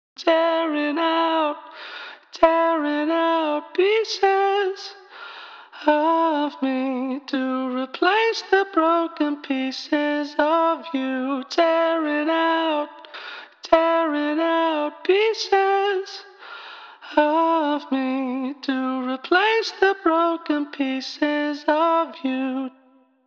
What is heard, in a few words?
vocal after